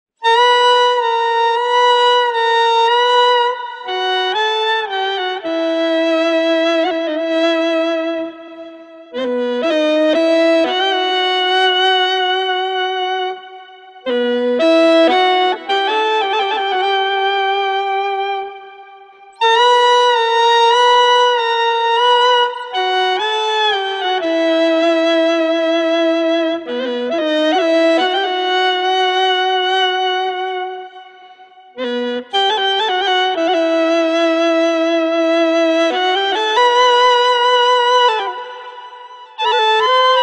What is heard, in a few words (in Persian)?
ساز